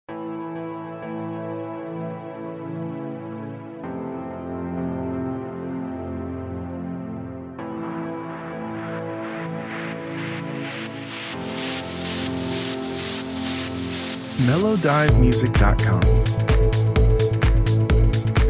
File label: a modern synthwave